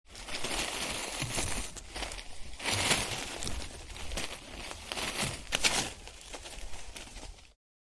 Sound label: Foliage movement garden brush leaves